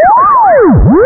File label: sine wave pulse